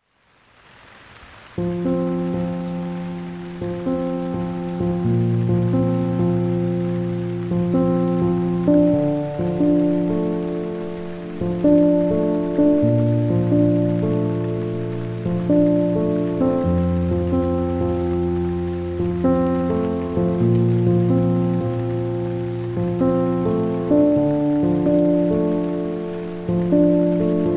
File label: Piano, Napping Music (With Rain)